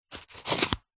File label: foley polystyrene foam rub scrape short; zapsplat